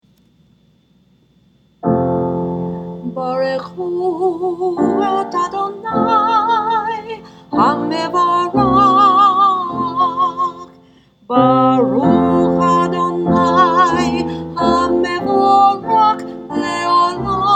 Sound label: barchu